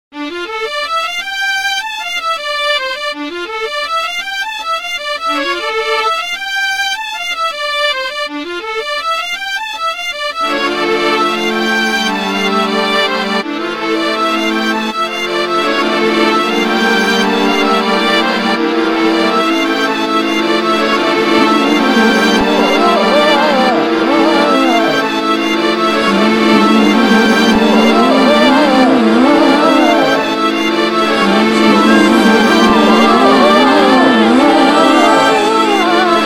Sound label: STRING THEME